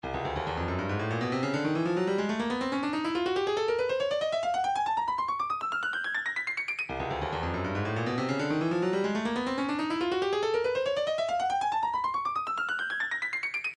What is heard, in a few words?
140 bongo; Rain Forest